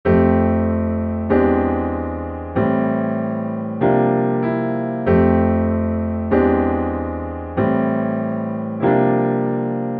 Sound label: compare tension sharp9